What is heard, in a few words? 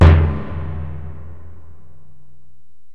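bass drum FX, dance drums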